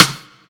clap sound f sharp key, Royality free clap one shot tuned to the F# note. Loudest frequency: 3418Hz; percussion; single hits one shots